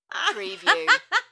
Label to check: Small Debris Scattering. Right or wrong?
Wrong